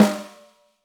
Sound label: Snare, Roland TD-20